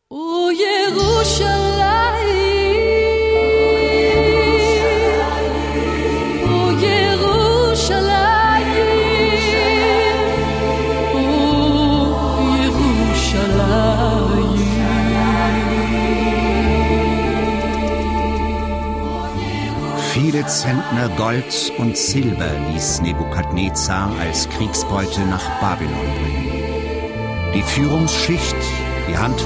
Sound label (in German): Gesang der Klageweiber; RESONANZ